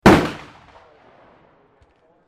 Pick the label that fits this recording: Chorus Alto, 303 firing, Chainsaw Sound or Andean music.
303 firing